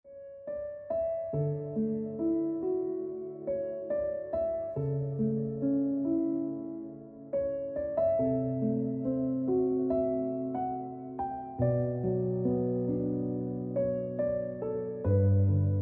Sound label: Piano Music